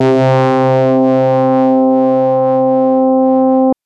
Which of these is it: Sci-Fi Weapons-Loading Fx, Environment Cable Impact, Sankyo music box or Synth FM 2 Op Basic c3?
Synth FM 2 Op Basic c3